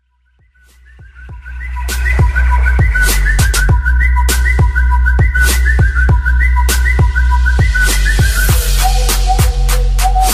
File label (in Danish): bedste samsung whistle mix, ringetone